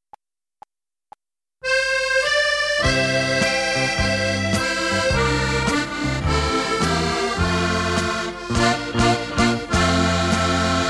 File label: Accordion Medley; F